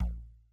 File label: rubber thud; angband